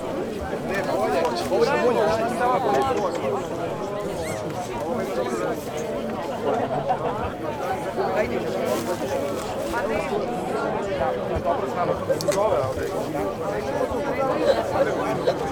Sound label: background crowd noise